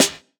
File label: Snare, JUNGLE BREAKS